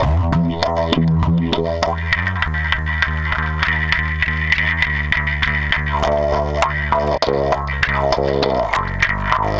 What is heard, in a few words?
Distorted Bass, Electric Bass